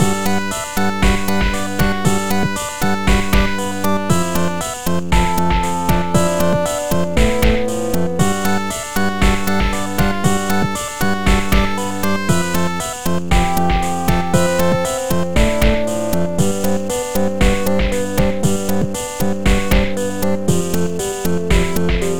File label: Living Waterway (Internal Percussion); Cave Story